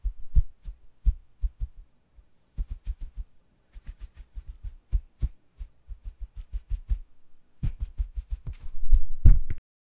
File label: a quiet thud the man, snatch